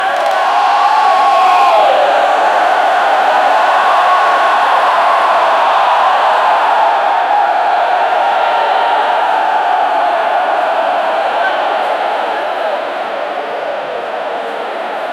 huge concert crowd

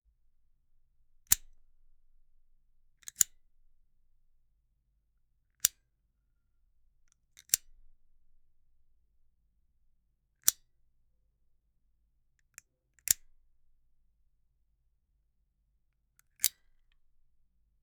vintage zippo lighter fli; 1960s

